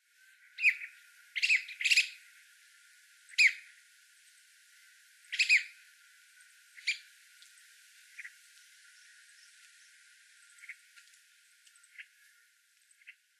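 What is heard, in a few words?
Masked Laughingthrush, akikawa; kaogurogabi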